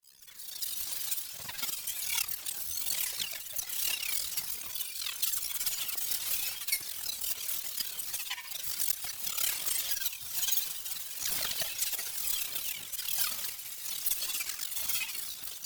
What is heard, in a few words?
PARTICLES SMALL FAST Digital Blizzard; NEON; boom library